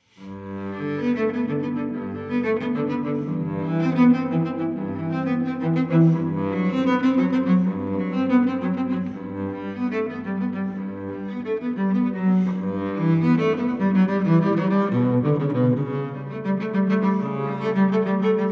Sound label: Cello Suite No. 1 in G major Prelude; BWV1007; Johann Sebastian Bach